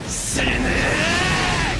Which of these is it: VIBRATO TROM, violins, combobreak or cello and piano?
combobreak